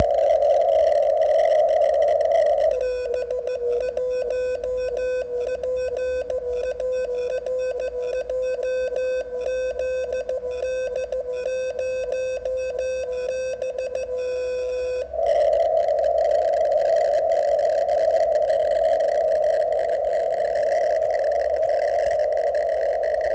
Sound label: beacon morse Code, nanosat; NCBR1